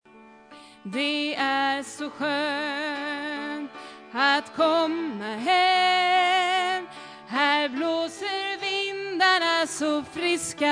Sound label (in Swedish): sopran, stammor